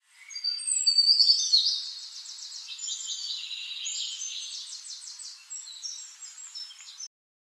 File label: birdcall, ambient